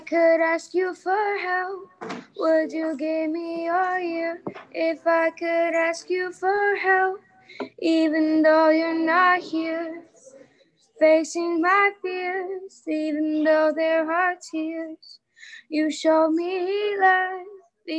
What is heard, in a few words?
sings group song